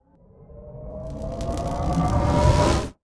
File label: Ares Tesla Arm Charge